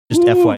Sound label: whoo